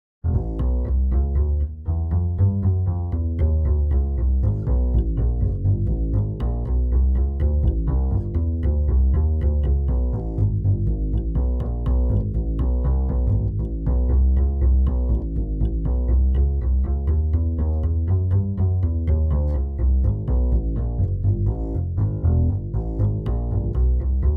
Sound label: Jazz Bass; Rick and Morty: Virtual Rick-ality